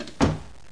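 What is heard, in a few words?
door slam; Image Bonanza; Expert Software; househld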